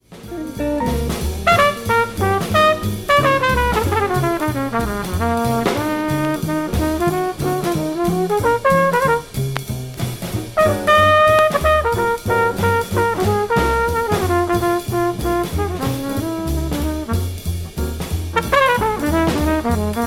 solo only, trumpet

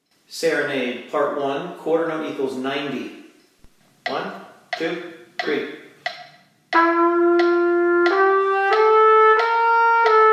Serenade, qn=90, trumpet; Rubank Int

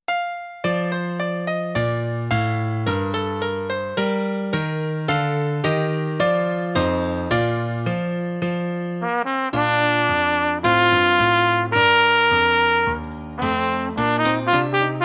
Solo With A, Trumpet; March; SOE Festival Solos Book 1; Scipio

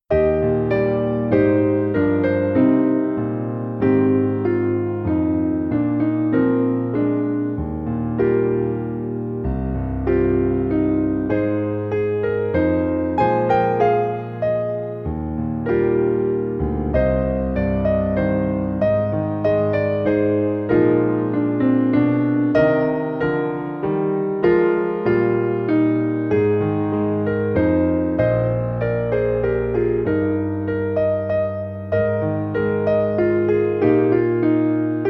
Play-a-long, Accomp., qn=96; accompaniment; trumpet

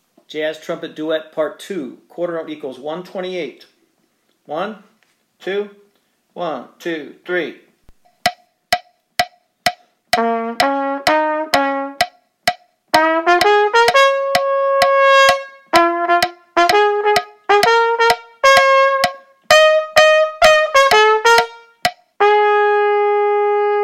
jazz trumpet duet; TTN Jazz Duets; Jazz Trumpet Duets! (#1)

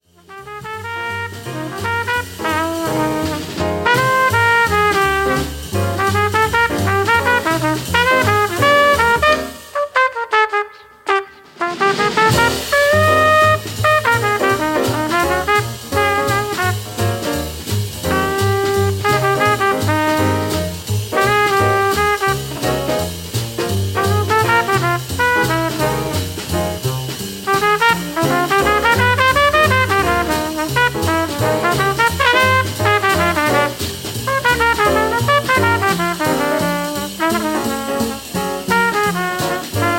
trumpet solo only

